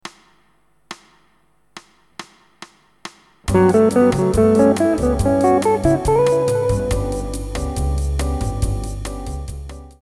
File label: lydian patterns